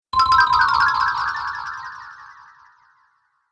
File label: Magical Cartoon Spell Disappear